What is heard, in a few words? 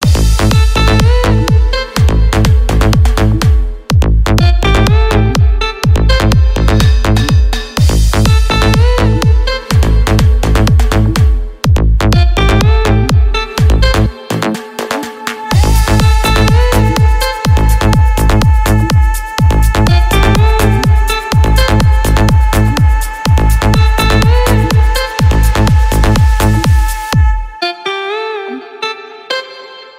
House Party mix